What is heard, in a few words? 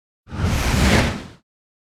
Whoosh Big Swift By Giant Strokes; Hollywood Edge; sound designer's toolkit v2